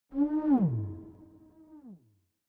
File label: swoosh; ludumdare32